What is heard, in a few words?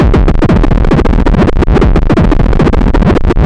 DR909 140, Hard & Loud Techno; USB Soundscan vol.01; AKAI